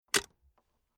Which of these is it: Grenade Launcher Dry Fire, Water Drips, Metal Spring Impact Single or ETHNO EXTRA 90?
Metal Spring Impact Single